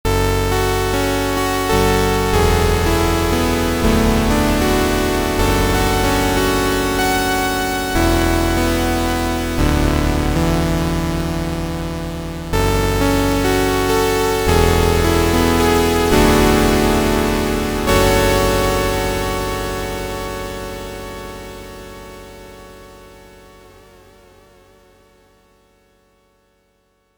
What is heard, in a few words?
dynacord ads sounds, saw